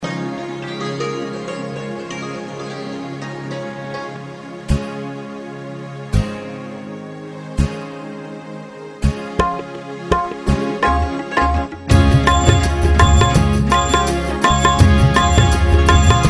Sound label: Karaoke Song